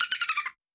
dolphin; SoundsCrate

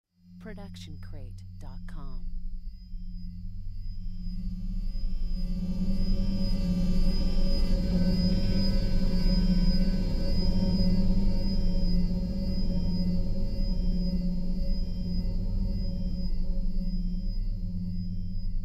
Sci-Fi Horror Ambience; SoundsCrate

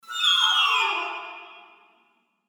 falling piccolo

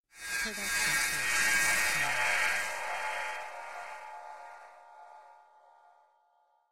anime ghostly riser

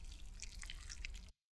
stylized squish; soundscrate

